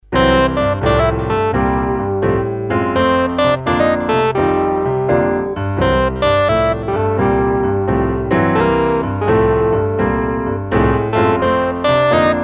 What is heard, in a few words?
Blues Mood